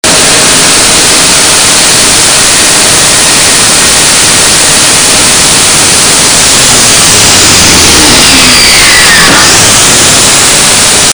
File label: saw